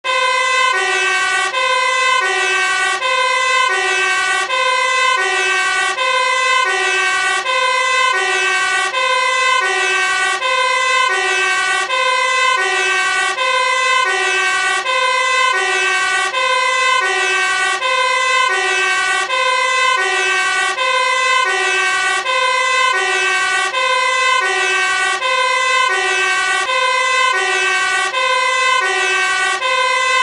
BRANDWEER SIRENE GELUID Dutch Firetruck siren sound